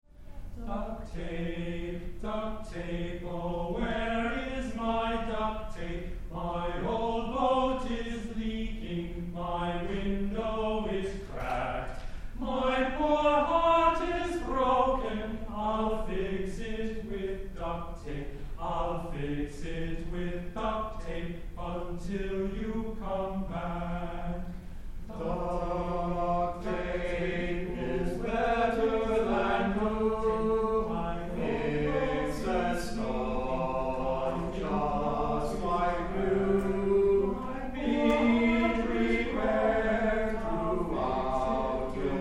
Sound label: Duct tape Madrigal in C major